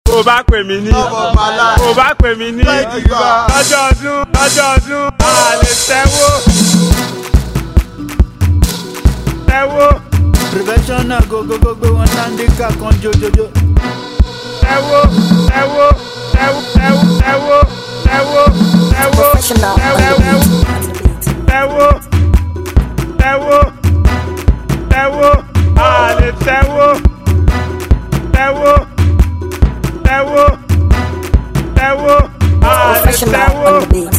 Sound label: Beat